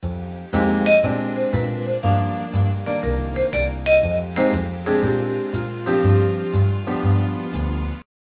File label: ringer; Ringtones